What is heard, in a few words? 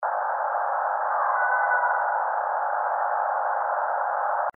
voice, bigfoot